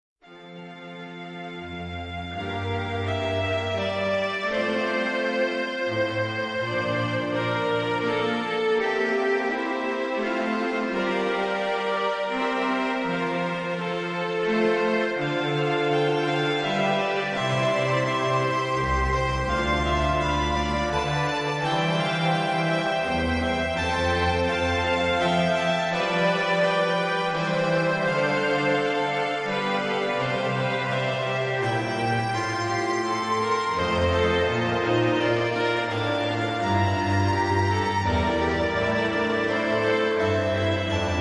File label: new two violins